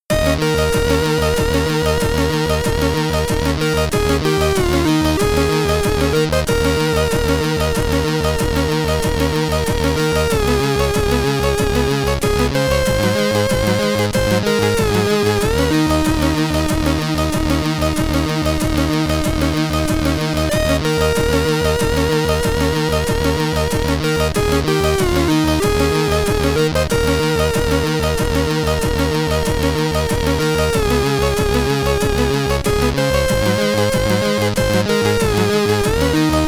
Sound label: Victory